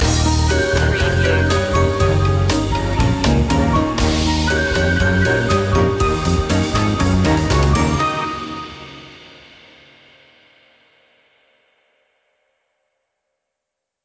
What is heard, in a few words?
jazzy classy loop end; media music now